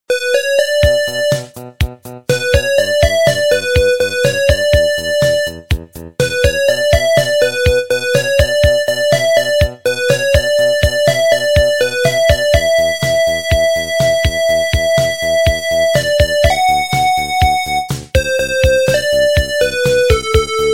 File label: ringtone